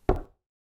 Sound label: step wood; Chelimbalo; Audio system rework